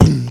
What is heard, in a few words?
Beatbox Kick, Human Beatbox